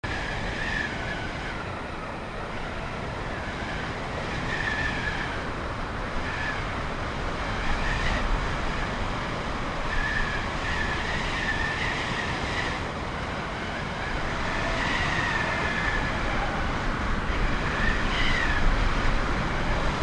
wind hollow; Go Ronald Voice Interactive CD

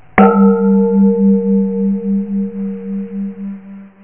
Pause clock; Prometheus 1.0

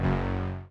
collide

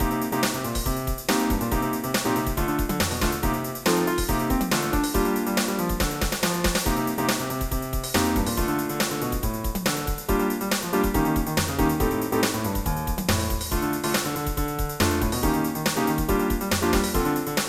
funk; 3800 MIDI Magic

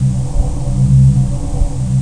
Sound level Test

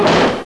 Thump

